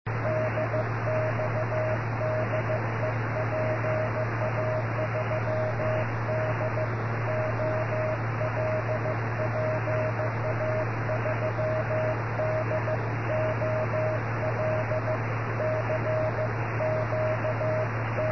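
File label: CW cq cq, 432 MHz, amateur radio; cq cq dir OK; PA3DOL JO22MT 718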